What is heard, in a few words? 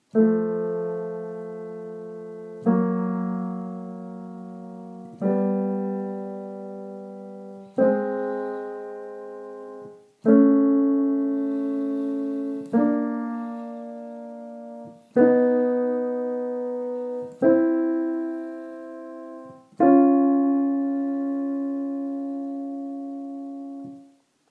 Roland M3 Unfiltered